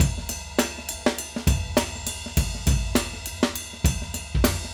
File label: Acoustic Live Drum; Platinum Audiolab